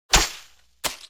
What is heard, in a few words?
drop sandbag miss